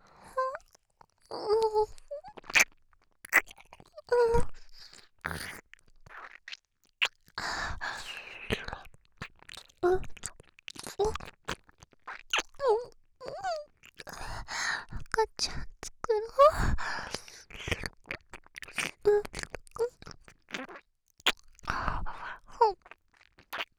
耳舐め喘ぎ; 赤ちゃん作ろ♪; DL製作班; ハーレムオナサポ 4人のメイドがご主人様のオナニーをお手伝いするバイノーラル

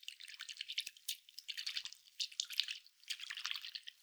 くちゅ, 水音効果音

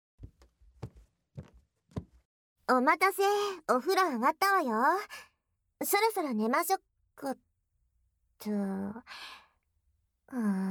嫉妬耳舐めご奉仕, ASMR; ツンデレ彼女

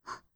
ブレスショート

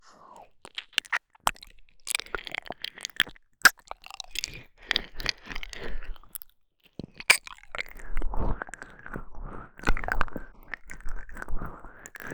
脳内 ペロペロ ループ

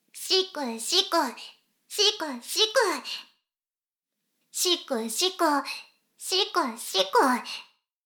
しこしこ音声; 少佐殿; 牧場戦腺

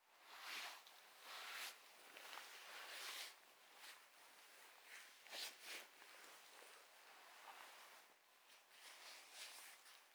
衣擦れ左へ; 動作系

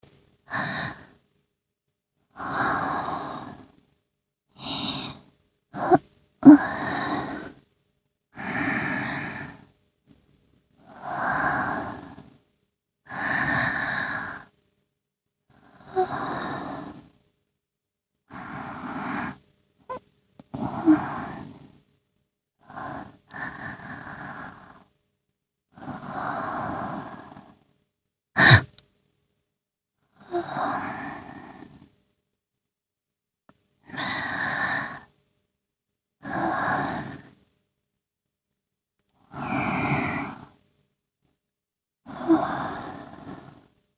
寝息; 男の娘といっしょ ぷらすあるふぁ; SEなし版

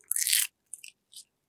引き抜き

